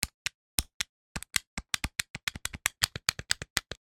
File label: household video game controller button press sequence; nintendo wii; sound effects; sound effects one